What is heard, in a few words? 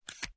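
cell antenna in, beeps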